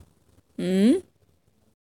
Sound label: Mmmm